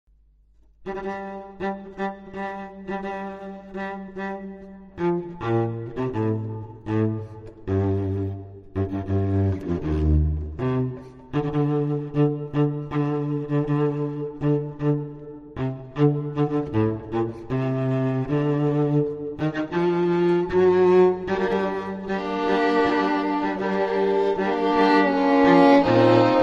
String Quartet; Il Natale

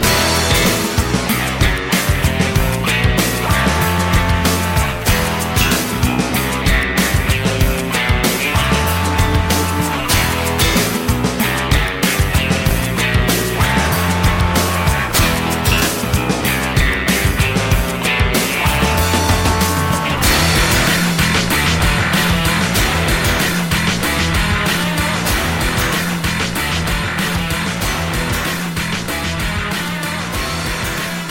space rock

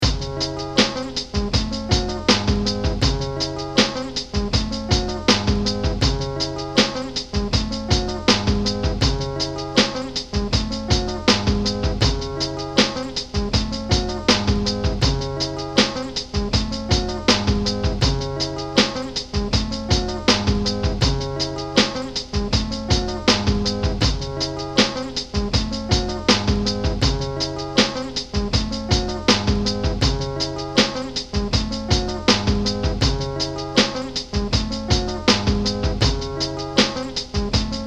Home Work Beat

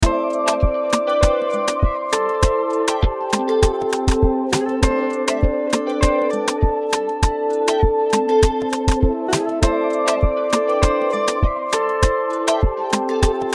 Solo

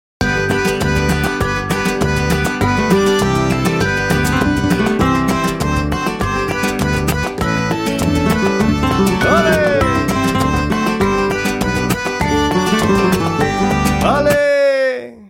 jingle bells world beat

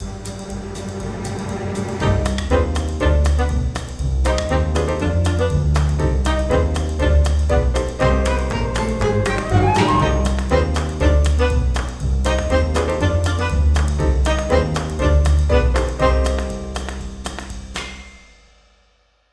Music

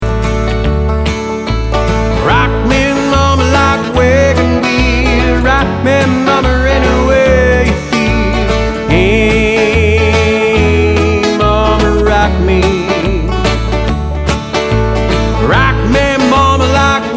ballad n blues

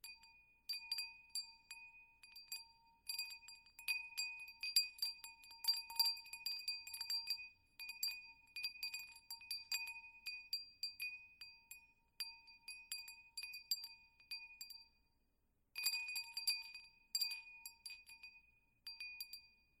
BALLROOM WINDCHIMES

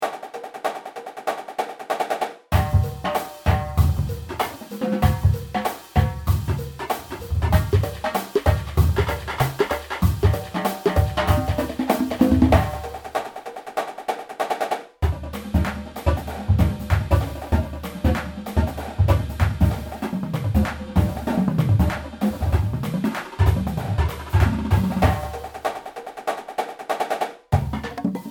Laid Back 192 BPM

